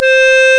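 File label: CLARINET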